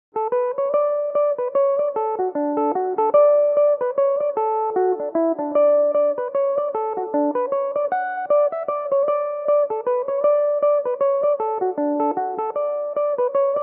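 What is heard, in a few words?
mazurka d major, mandolin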